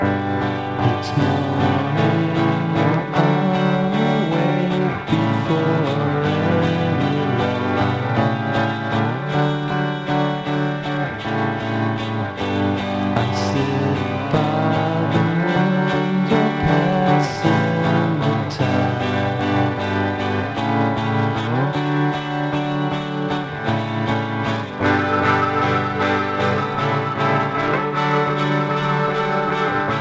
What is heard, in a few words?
BLUES ACOUSTIC, guitar pop